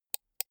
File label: computer mouse right click soft